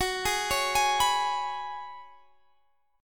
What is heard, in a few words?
F sharp Suspended 2nd Suspended 4th, Guitar Standard; F#sus2sus4; x,21,18,18,21,19; arpeggiated; capo=2